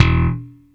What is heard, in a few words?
E1, Steinberger; InVision Interactive; Lightware VOL-1; Instruments & Percussions